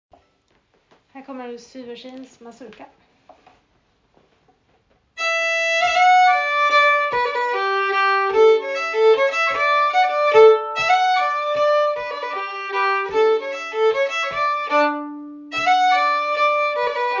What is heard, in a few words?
Mazurka; Syversins Mazurka